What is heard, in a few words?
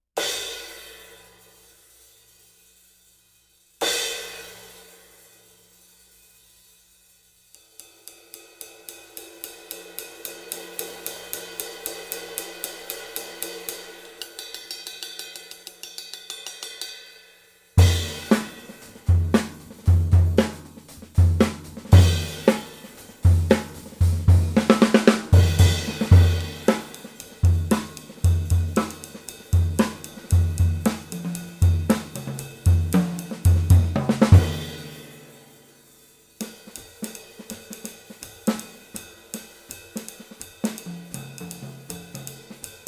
Zildjian 20 Cust